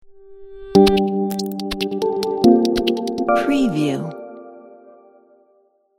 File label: logo